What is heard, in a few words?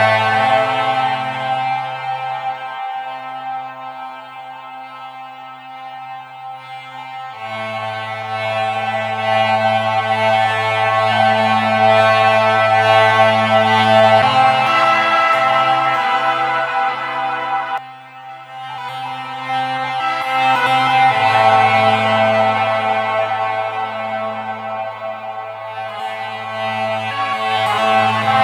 keys, Loops, Sad